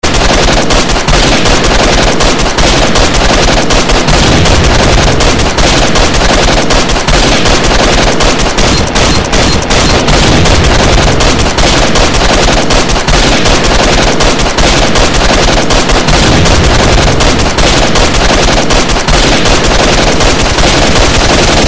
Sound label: Airsoft gun sound